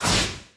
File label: Team Ball Fly Popup; Se Team Ball Fly Popup